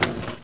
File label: door move; lv426; doors